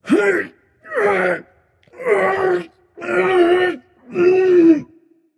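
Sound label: grunt lift, male; smite; voicepack; rama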